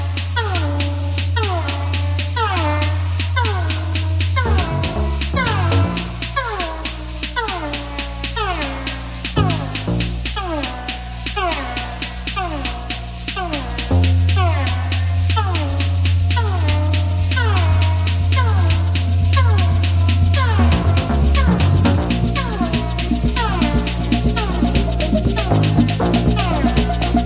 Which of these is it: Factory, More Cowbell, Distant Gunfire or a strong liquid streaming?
More Cowbell